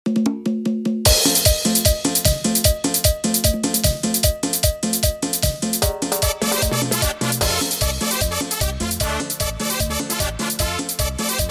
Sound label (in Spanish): Cover Instrumental 151 BPM